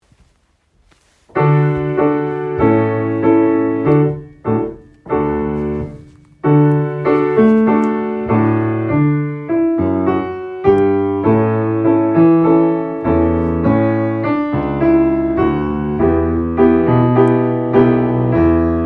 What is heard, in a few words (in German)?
Klavier